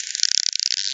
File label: Hogsmill possible Daubenton, bat